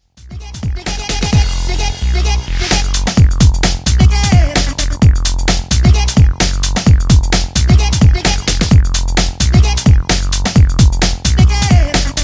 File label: SWING